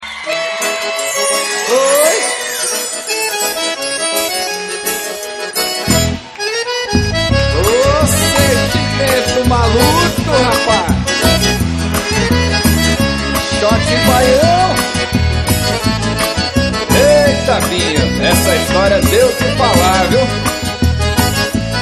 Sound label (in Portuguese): xote baiao ao vivo